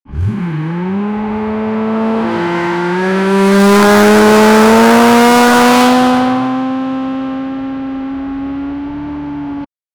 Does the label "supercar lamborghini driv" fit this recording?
Yes